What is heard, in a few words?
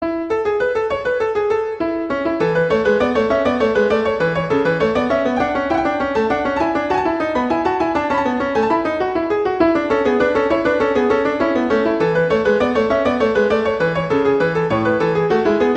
Bourree; Bach; Eng Suites